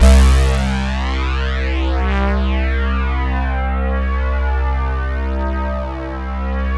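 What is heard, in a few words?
synth Fat C2; Best Service Gigapack 1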